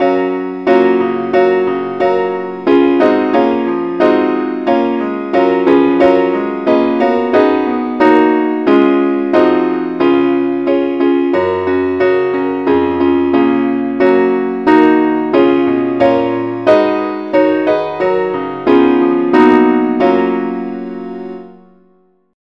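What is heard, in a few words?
keyboard, key F sharp; saintthomas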